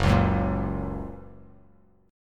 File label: F sharp Major, F sharp, chord; strummed; 2,4,4,3; JGuitar